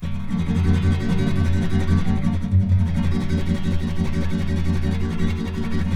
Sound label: G# M, Guitars; Spectrasonics; Hans Zimmer Guitars VOL-2; FLAM TREM CH